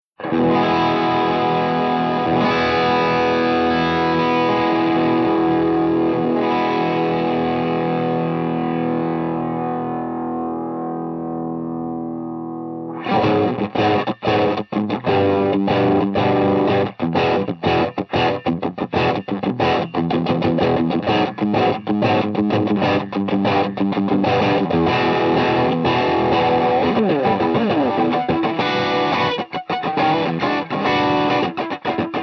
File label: FUZZ